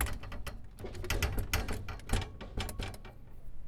Metal Rattling; BB Retail Therapy Sample Pack

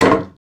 wood misc, wood metal; wood metal SFX

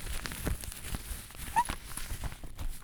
Squeaky Rustling; BB Retail Therapy Sample Pack

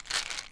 Vitamin D - Roll, pill bottles

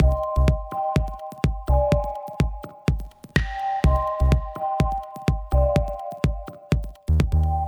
Motivated, Loops

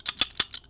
Pipe Wrench; bb - Smol Mechanisms

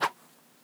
Swish, organic wooshes; Micro Pack

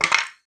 wood falling; wood metal SFX

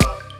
Snare Bop; Drums; BB 2HTC Samples Vol 4

